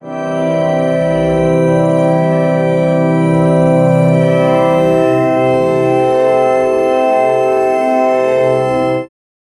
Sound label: a short distorted heavily, organ